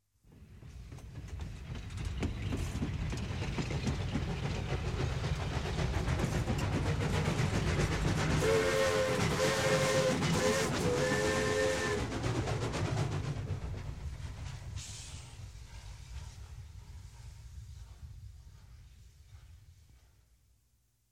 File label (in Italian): TRENO A VAPORE PASSAGGIO CON FISCHIO; trasporti; treni